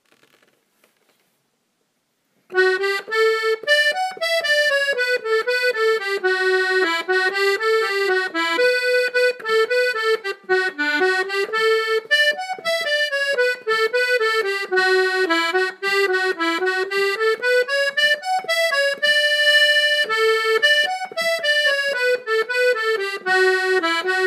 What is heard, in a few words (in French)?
Reel des Accordeonistes